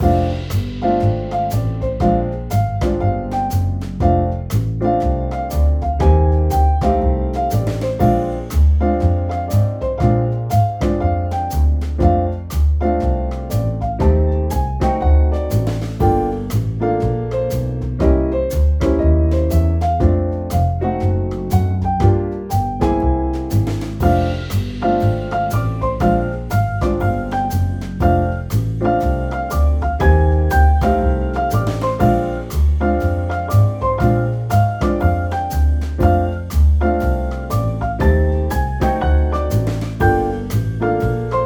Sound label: Music